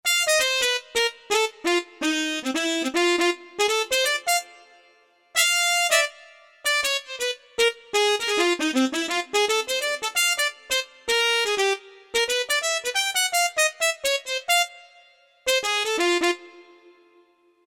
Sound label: red - Sax & Mute, E-mu Proteus FX; Factory Presets